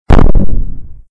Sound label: Cannon; Doodle Jump; 3DS, Android, Arcade, DS, iOS, Mobile, Xbox 360